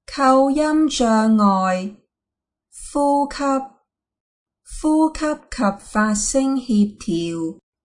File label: exercise breathing exhale; aphasia